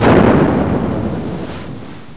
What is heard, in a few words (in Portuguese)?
explosao